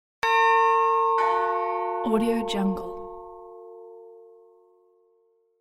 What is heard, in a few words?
door bell